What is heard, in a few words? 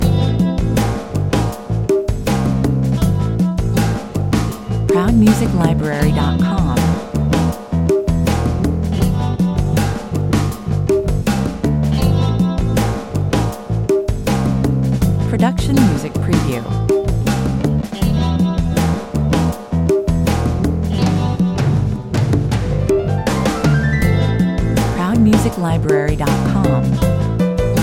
stay relaxed 80 bpm